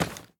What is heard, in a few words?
battery drop